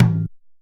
JUNJUNBA HIT; Best Service; World Colours

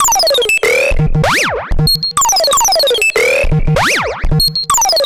Perc 95; musicradar

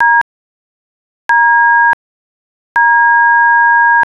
keypad d, phone

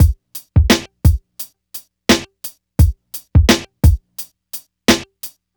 DRM, Hip Hop and R&B Drumloops; Best Service ProSamples vol.01; AKAI

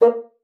bassoon, A#3; Xsample VOL-6

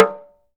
TALK DRM, Heart of Africa; Spectrasonics

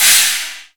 china cymbal

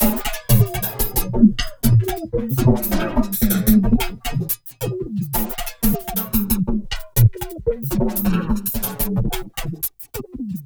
swell beat 090bpm; Zero-G; Downbeat Dance Grooves

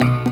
PLUCK, Synth FX Misc

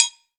R AGOGO HI, Drum Mashines; EdgeSounds; Drum Mashines VOL-1; R-100 DRUMS

